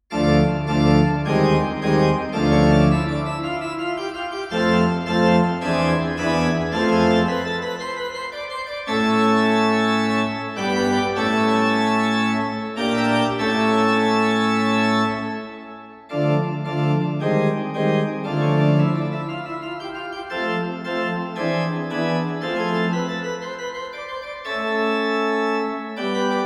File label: postludium, organist; velten; organist online recordings